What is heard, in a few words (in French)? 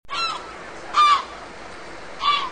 Mouette, Animaux; bruitage